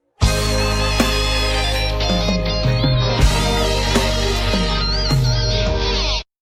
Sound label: beetle riff